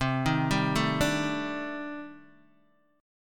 C Minor 6th Add 9th C Guitar Open D

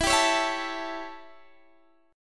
A 7th E, chord

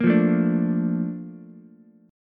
Bb Major 7th Ukulele, chord; BbM7 strummed; JGuitar

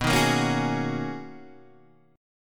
B Major 13th B down Guitar DADGAD

